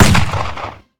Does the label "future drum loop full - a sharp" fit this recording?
No